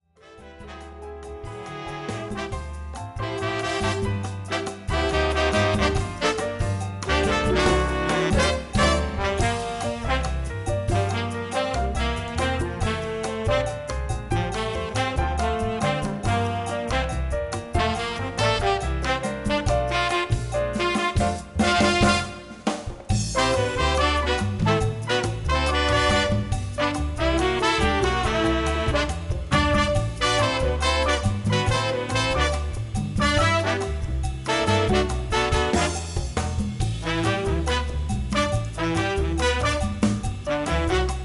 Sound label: Jazz Ensemble